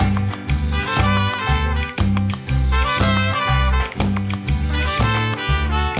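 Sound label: food world music inferno suspense no vox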